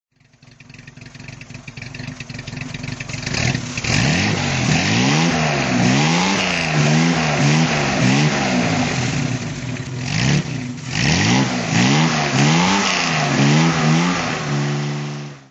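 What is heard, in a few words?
jaguar xk140 throttle, engine sound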